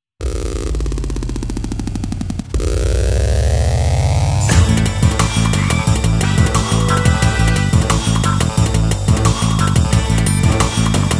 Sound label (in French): AMB POURSUITE